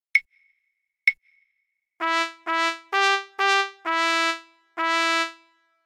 dyktando trabka; Nagrania Lokomotywy

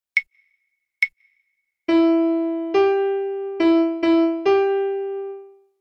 dyktando fortepian; Nagrania Lokomotywy

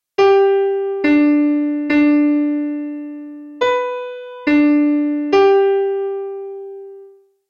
fortepian; Nagrania Lokomotywy; edukacja wczesnoszkolna

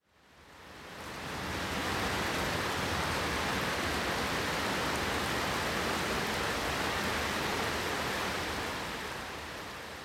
pogoda; sekwencja 3; Nagrania Lokomotywy

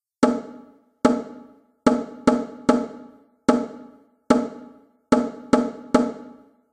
bebenek; Nagrania Lokomotywy; edukacja wczesnoszkolna

